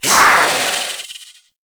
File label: Kavat Vocal Attack Medium; Warframe; Warframes; Khora